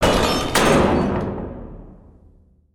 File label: Gate Close; foley